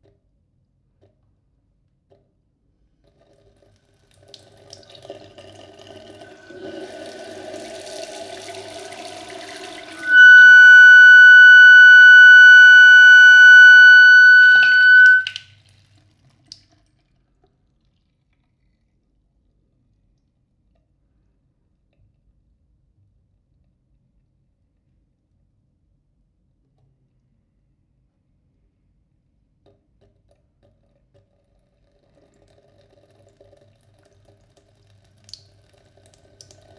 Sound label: parisian tap